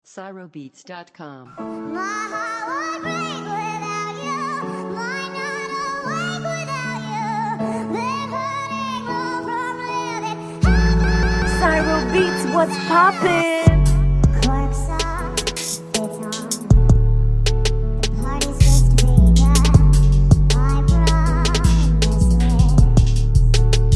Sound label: Type Beat